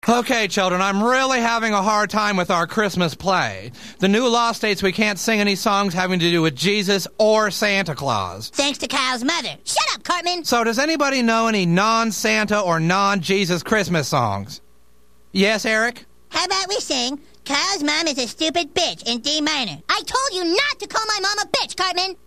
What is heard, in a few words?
dminor